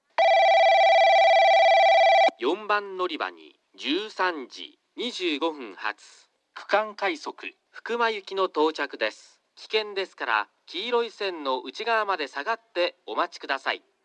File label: kurume regional rapid fukuma, portable recording; 4番のりば接近放送（区間快速 福間行き）